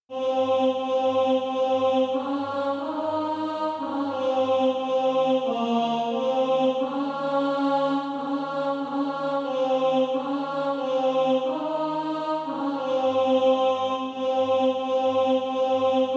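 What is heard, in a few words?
alto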